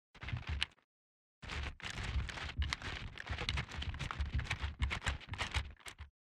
movement walk; Ranger

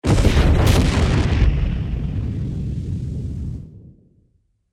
Explosion

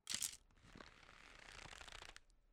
pulling arrow

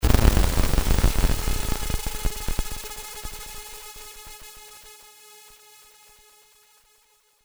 ROBOT BREAKING DOWN

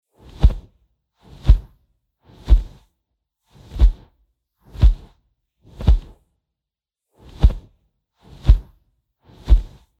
Cockatrice

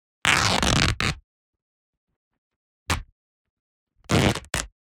cloth tearoff

